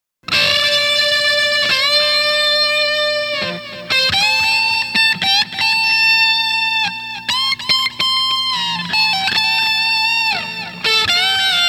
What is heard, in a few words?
Power Boost through Reeves max pb drive and high guitar vol